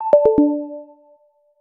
Low Battery

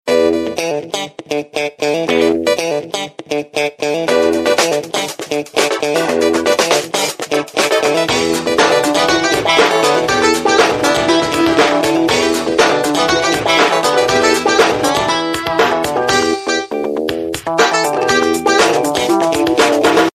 midi ring

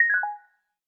Lock Unique; LG K83 5G

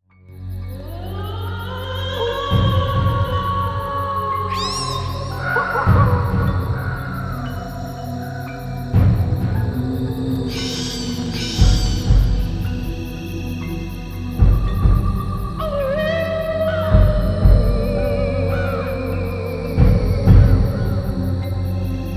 Halloween graveyard haunt; Arphiola ringtones

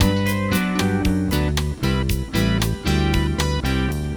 bossa, ringtones; Google; NewWave Labs

